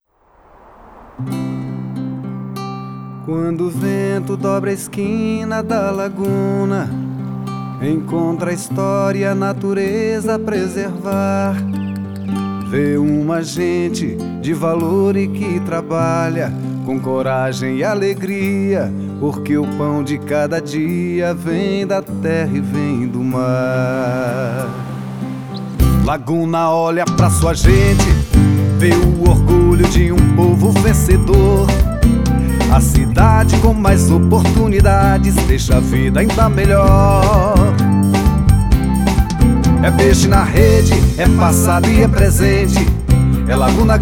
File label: jingle; Laguna Vencer